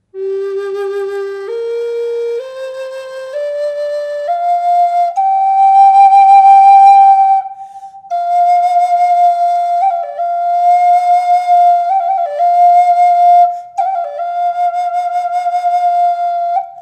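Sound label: reverb, flute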